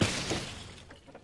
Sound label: object hit wood barrier; lightning speed